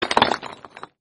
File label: rock sound